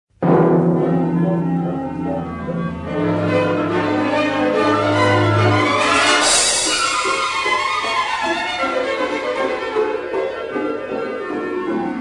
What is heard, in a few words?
Tarantella Napoletana; Classicas